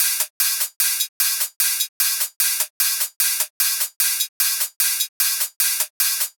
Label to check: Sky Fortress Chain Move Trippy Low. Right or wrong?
Wrong